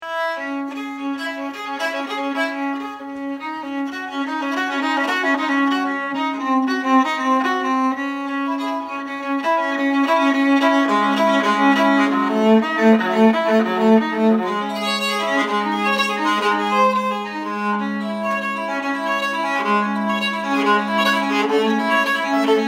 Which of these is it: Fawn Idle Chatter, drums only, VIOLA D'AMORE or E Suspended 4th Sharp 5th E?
VIOLA D'AMORE